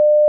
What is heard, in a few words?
bbm end call; Oppo R821